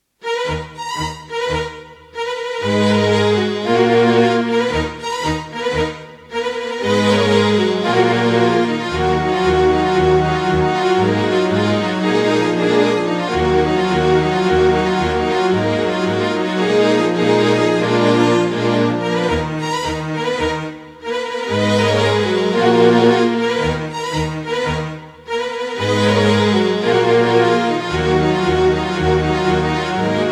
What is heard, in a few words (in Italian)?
Minuetto in Mi Bemolle Maggiore